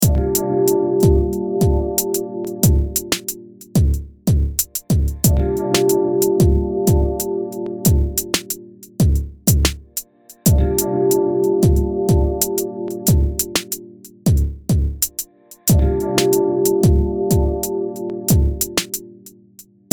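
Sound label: lifeStyle 92BPM, Loops und Beats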